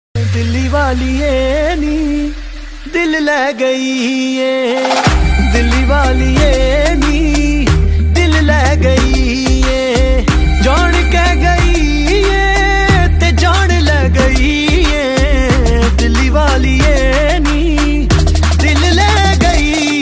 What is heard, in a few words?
punjabi ringtone